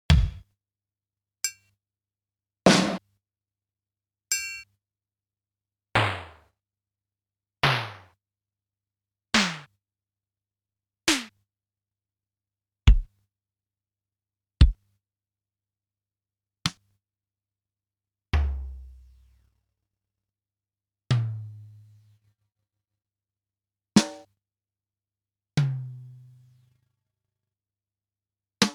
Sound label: yamaha pss 790 samples drumkit